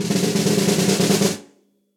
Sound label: Snare Press Room; Snare Articulation; Drum Hits; Session Drums Studio; Standard Hits